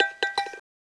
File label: Cup In